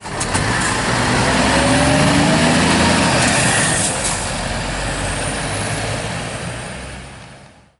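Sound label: scania, bus; Simutrans; Pak128.Britain-Ex; h2870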